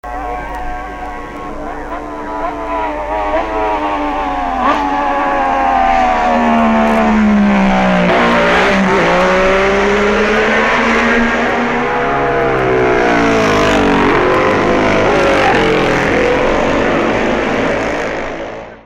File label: MV Norton AJS; 1966